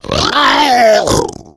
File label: female boomer spot prey, voice, attack; Left 4 Dead 2